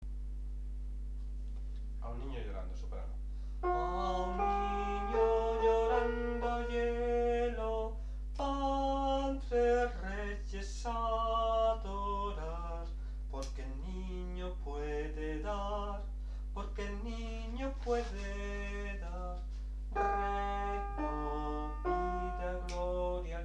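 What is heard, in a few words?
soprano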